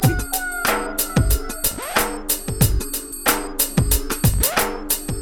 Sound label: PHATT BEA; E-MU Formula 4000 Series Vol. 1; Hip Hop Nation; PhattBeats91-94